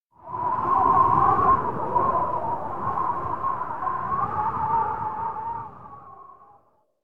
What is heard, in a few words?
clear wind; anemoi